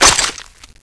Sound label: knife hit, weapons